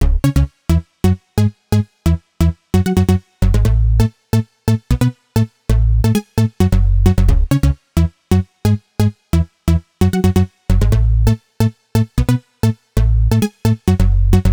132 House Hibass C#Min; Dance DJ; Digidesign; Pro Tools; Pro Tools Essential Loops

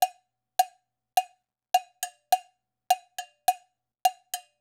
Dance Hi Bell; Dance DJ; Percussion; BellCowbellAgogo; Pro Tools Essential Loops; Digidesign

